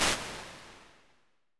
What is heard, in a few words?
Ambience; Apple